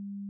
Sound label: sfx whoosh